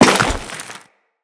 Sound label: Crewman Step Ice, Footsteps; Enemies; Corpus